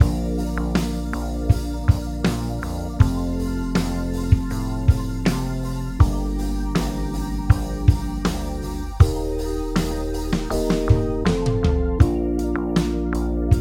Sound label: Eb More Slurs, brass